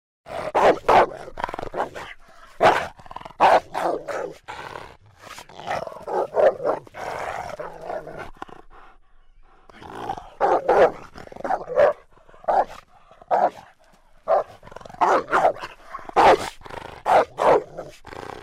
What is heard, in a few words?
animals